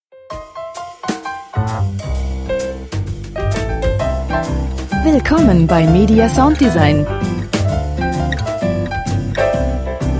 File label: jazz